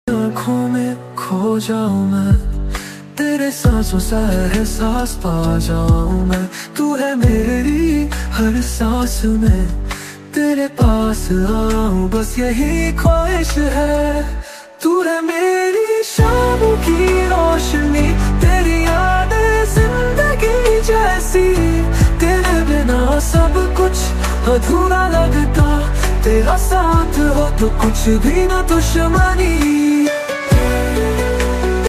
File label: hindi ringtone love ringtone romantic ringtone